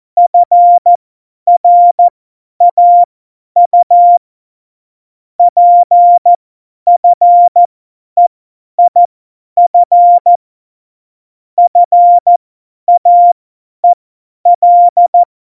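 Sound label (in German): Witz, Morsekurs, cw morsen; Witze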